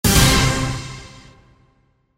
デデデン 逆

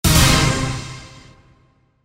デデデン 逆

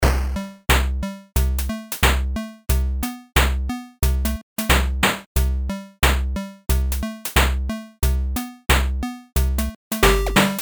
チップチューン intro 90BPM

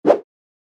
場面切り替え フッ 風

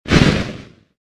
モンスターの羽ばたき 1回

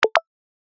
メッセージ

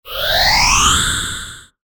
タメ

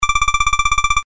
会話

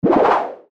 ポヨヨン コミカル

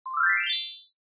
キラリン 中

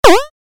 ジャンプ レトロ

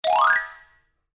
場面切り替え 木琴 上り 速め; 場面切り替えや心理描写; 演出効果音

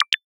メッセージ

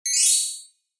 キラリン 金属 短

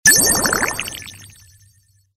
ポーション

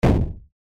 インパクト ボン; 場面切り替えや心理描写; 演出効果音

